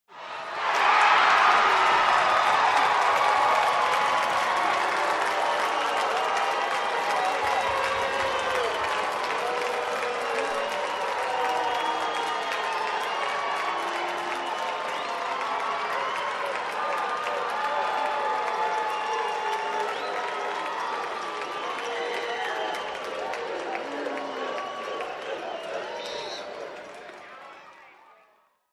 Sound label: Crowd Applauding and Booing